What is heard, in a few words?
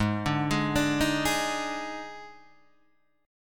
D sharp Major 7th Suspended 4th G sharp